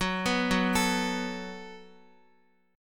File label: Gb Minor Double Flat 5th, Guitar; Gbmbb5 arpeggiated